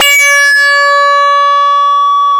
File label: SYN, SYN Analog